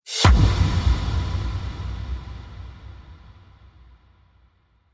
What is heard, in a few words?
Cinematic Dark Hits; Hit Sound Effects; sound design